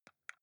console controller xbox arrow key press slow

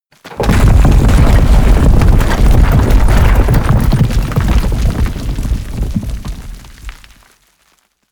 large roll drop

